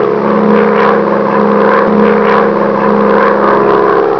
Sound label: edge540, Model; FMS